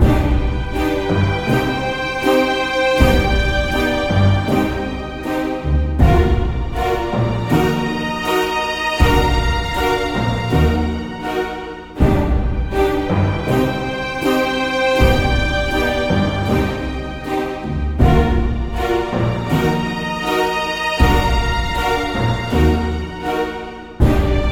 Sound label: BGM; World 6 - Ancient Altar